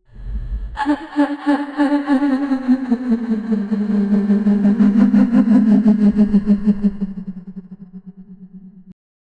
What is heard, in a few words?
female ghosts terrifying; earthquake